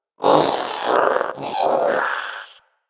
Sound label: Companion Vocal Breath Walk, Pets; Zanuka